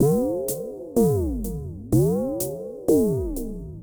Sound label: HOUSE 125; eJay